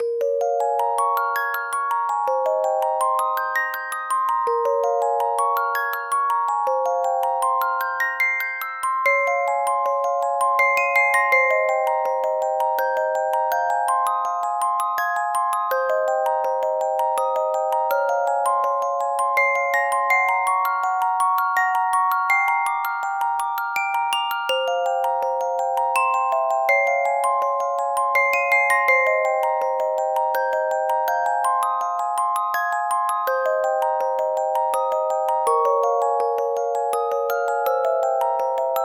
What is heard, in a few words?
musicbox spiritual, 神秘的